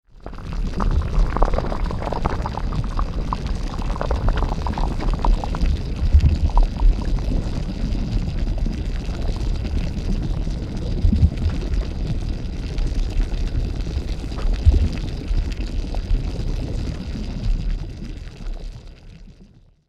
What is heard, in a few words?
Lava lake bubbling magma loop; volcano